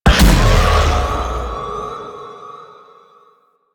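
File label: Blast Impact; Warframe; Caliban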